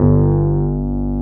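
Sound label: Wurlitzer G1 - R; USB Soundscan vol.09; AKAI; Keyboards Old School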